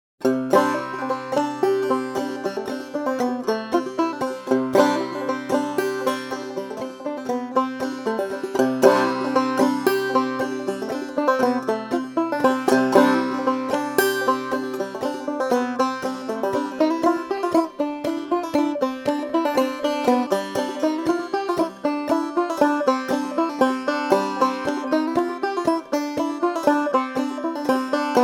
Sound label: Sequential Rain